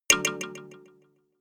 notification kalimba delay major

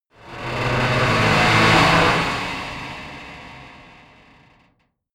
metal metal grind large

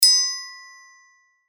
triangel

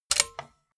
socket pull plug

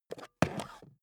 charger pick up

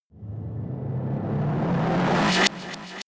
reverse hit low ping pong

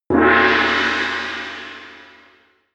gong short

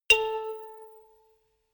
notification kalimba rattle

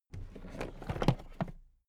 toolbox raise heavy

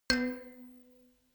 notification kalimba rattle low

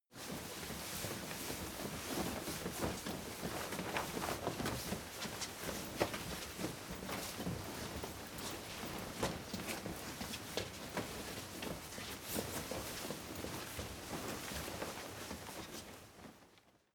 crowd movement sequence

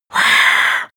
male breathe eerie

